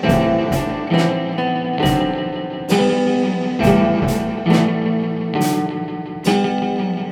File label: acoustic Pickup